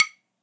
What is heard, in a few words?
Drumsticks Woodtip 7b Hickory; Lutner